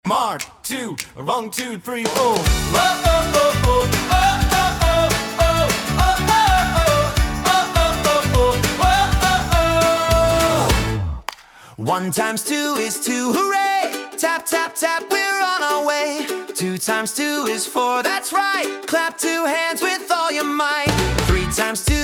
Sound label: Toe Tapping Twos Fade Out